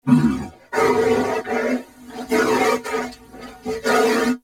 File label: Horse Trot Breath; Vehicles; Vocals; Warframe; Duviri